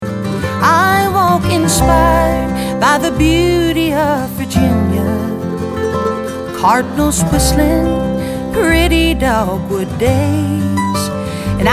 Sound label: bluegrass; Virginia Beauty